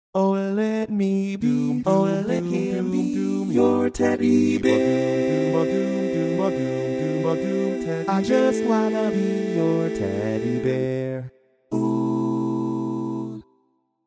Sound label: Lead, barbershop